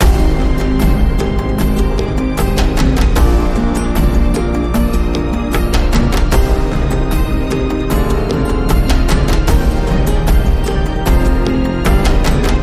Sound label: Cinematic Evolution, Loop